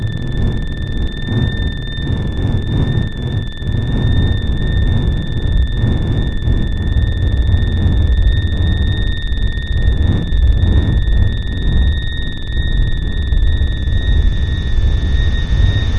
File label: Drone Combat